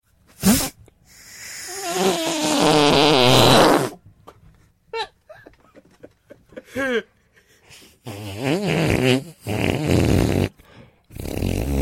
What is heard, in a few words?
farts